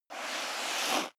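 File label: step high heels sand slide long, footsteps; schritte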